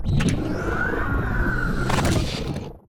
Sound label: battle cannon main prepared